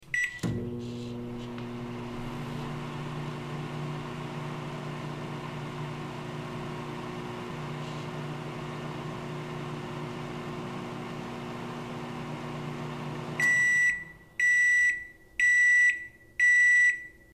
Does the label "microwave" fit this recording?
Yes